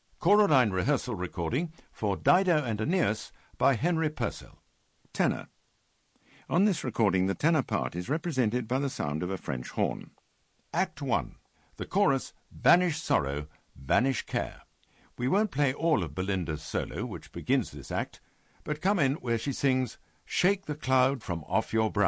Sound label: Tenor; Purcell D&A